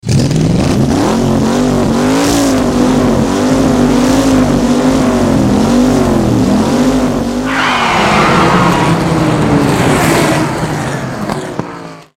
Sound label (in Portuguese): motor v8